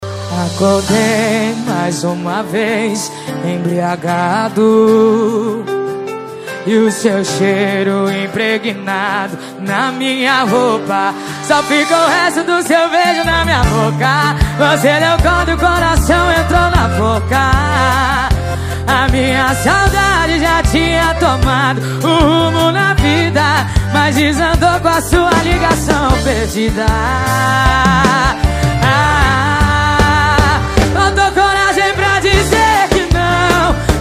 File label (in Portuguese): sertanejo